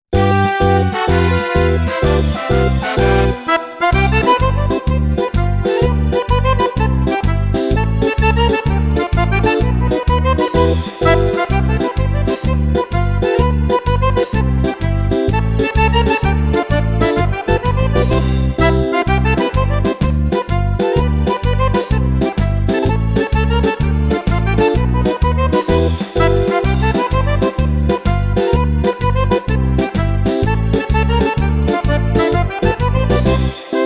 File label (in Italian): Tarantella, fisarmonica; fisarmonica in liscio; Tarantella burlesca